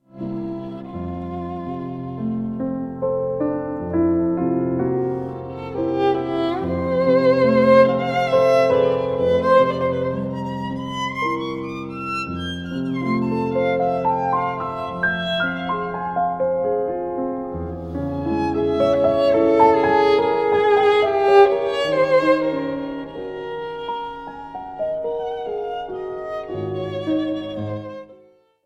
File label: romances for violin and piano; clara schumann; 3 romances op 22